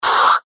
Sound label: stun; slender; gunner